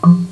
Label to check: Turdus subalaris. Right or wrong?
Wrong